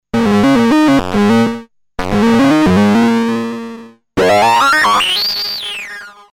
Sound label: MS20; FreqBox